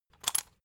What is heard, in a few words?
salt mill metallic; gastronomie; soundpool